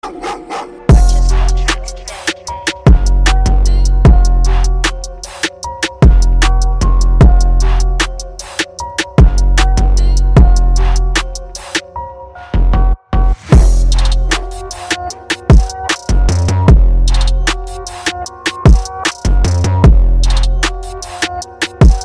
Dark Type Beat

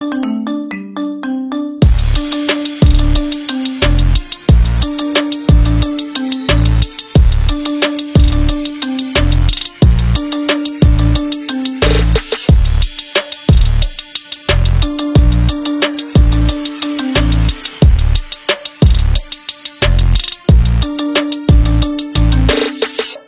Marimba Remix

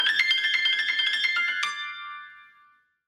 IPhone 12 Blues IOS 14

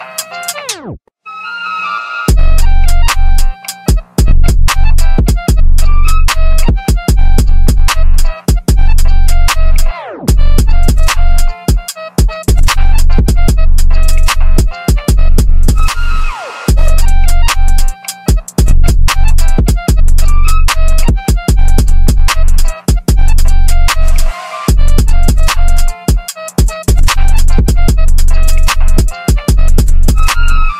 Nokia Arabic

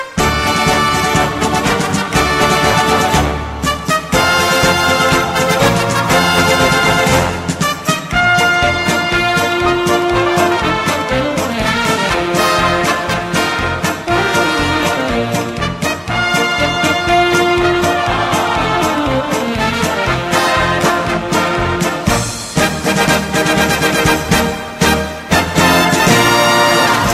Paso Doble

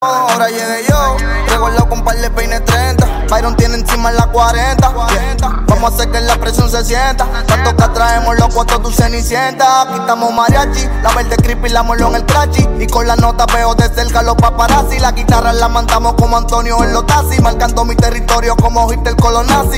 Dominican Mariachi

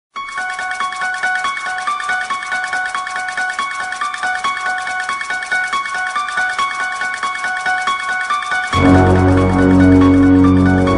RINGTONE Halloween

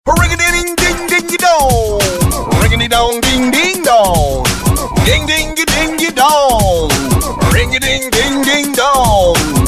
Ring a Ding Dong Ringtone; Geico

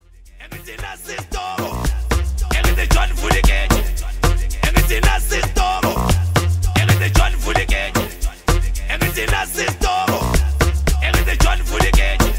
Jazz

